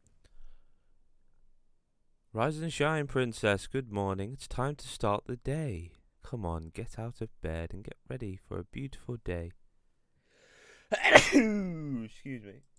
sneeze

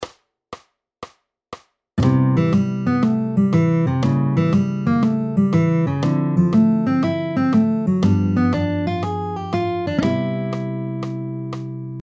Arpeggios - Practice Routine - 120bpm; The Power of Arpeggios

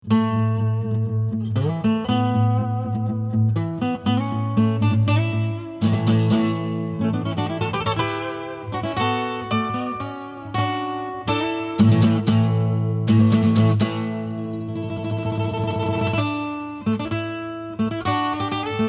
Acoustic; Overwatch Theme

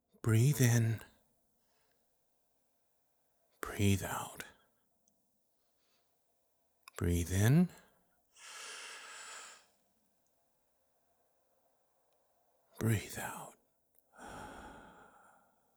Ringtone

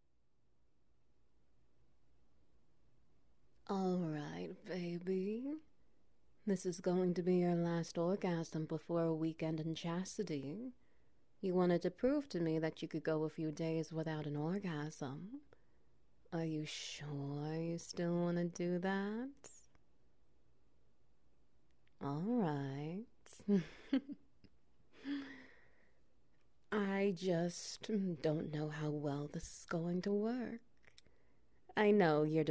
Wet Noises

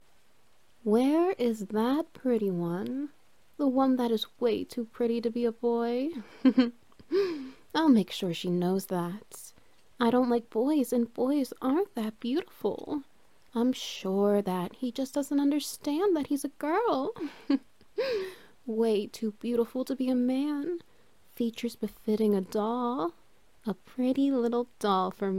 Wet Noises

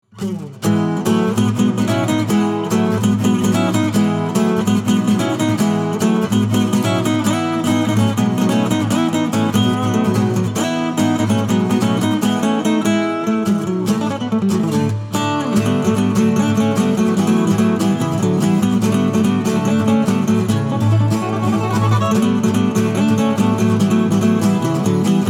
Acoustic

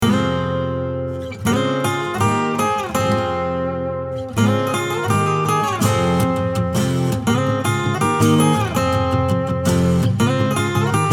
Acoustic

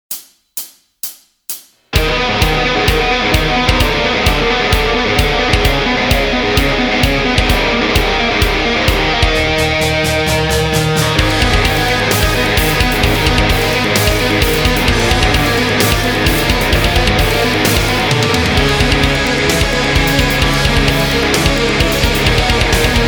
Tapping; Torture

